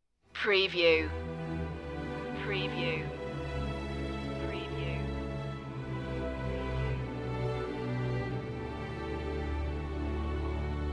intro part with choir

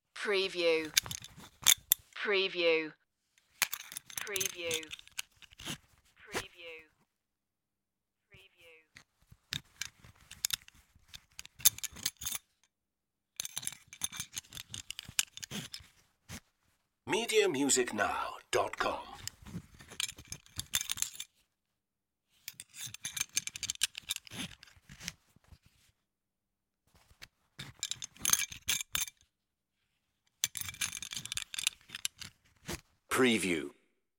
belt buckle

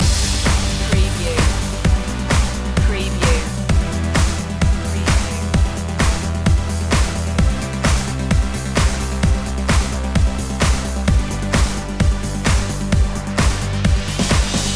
positive energy loop

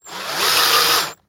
Cordless Drill Tight

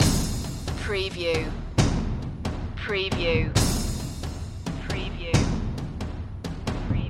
crystal cavern percussion loop; big percussion

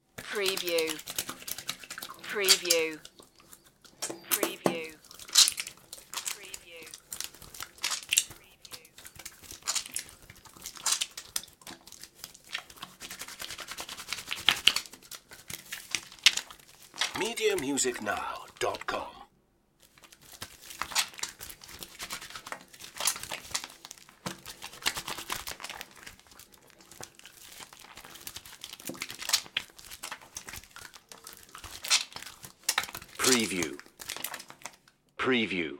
soap hand wash; Bathroom

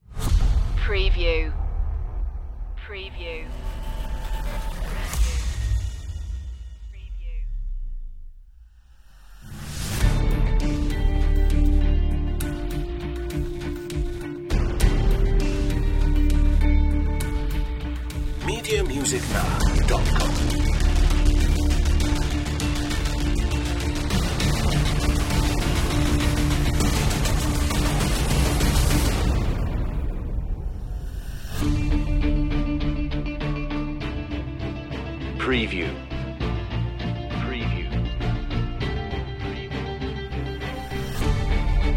less drums more synths

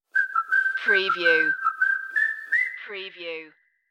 upbeatful stinger

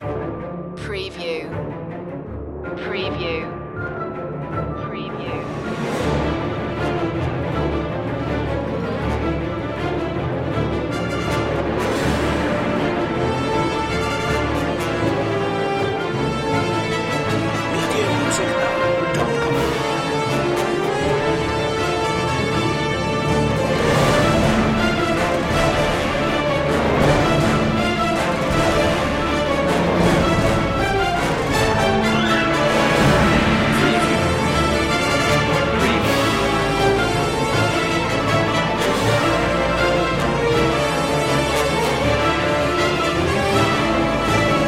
no choir

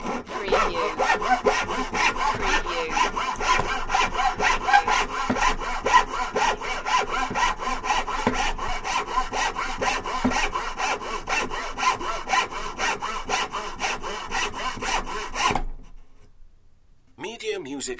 Handsaw

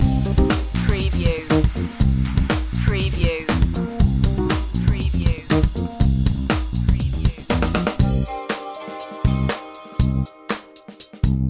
funk wah loop

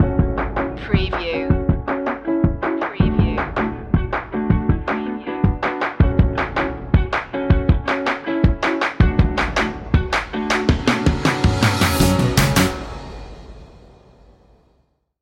funky hop

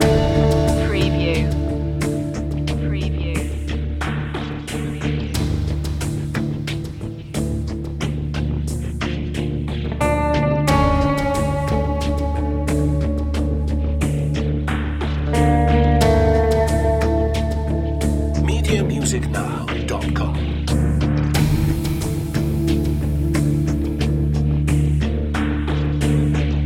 sweltering heat loop

